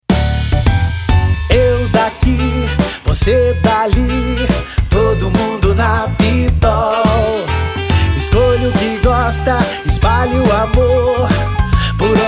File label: jingle; unica propaganda portfolio pittol natal2019